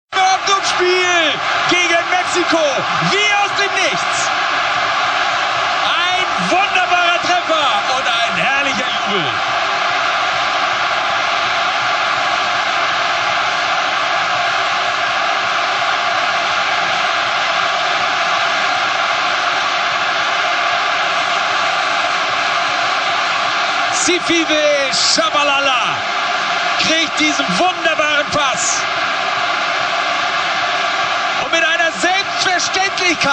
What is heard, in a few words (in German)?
vuvuzela nachher